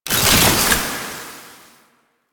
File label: Alchemy Grenade Pickup Toxin; Warframe; Entrati